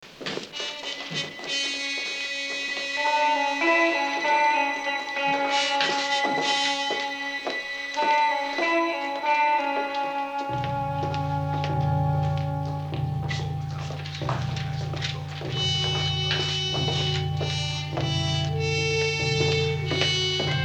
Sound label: echoing trumpets